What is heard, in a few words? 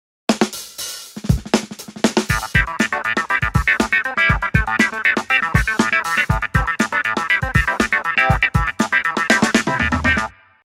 clavinet